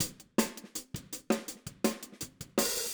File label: Hi-Hat, 162 BPM; Drums; Drums from the Big Room The Mixes; Take Your Toms Off; Verse